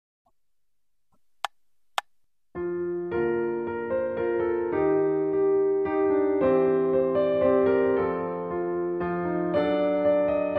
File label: We Wish You A Merry Christmas in B flat Major Piano accompaniment Slow; Arr. Dewagtere